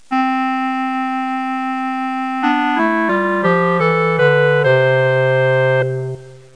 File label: clarinet; Music Game (1994)(Microforum)